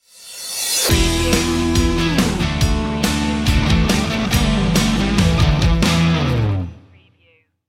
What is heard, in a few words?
rolling free stinger